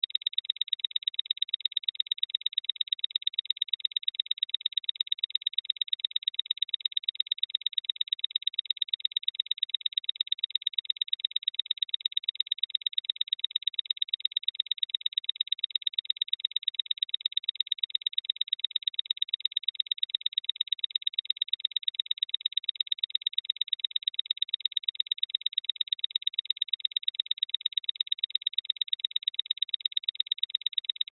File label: Science Fiction Beeps Timer Counter Device; Tech (Sound Effects:Science Fiction)